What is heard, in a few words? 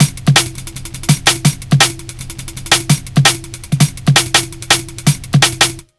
166 loop, Jungle; USB Soundscan vol.05; Explosive Jungle; AKAI; 90s sample CD